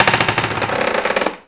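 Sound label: boat creak; envrn; The Elder Scrolls III Morrowind GOTY; XBOX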